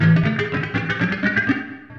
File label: Guit Rising Scratch; Sonic Foundry (Sony Creative Software); Ugly Remnants VOL-2